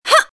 Jump; Krystal

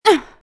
Ugh; Krystal

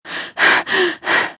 Breathing; Krystal

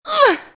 Groan; Krystal

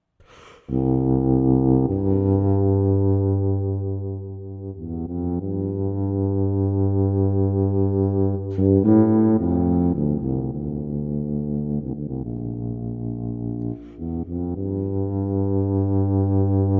Theme Tuba; Fan Music

